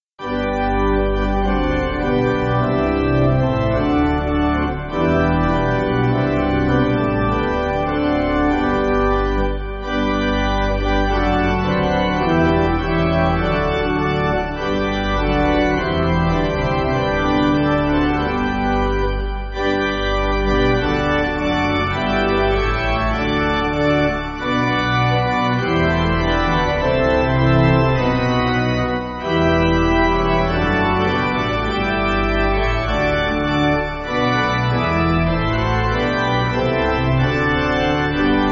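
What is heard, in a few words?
Pipe, Pipe Organ, Music Backing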